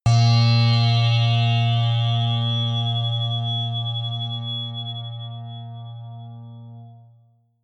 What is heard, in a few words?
synth saw hit high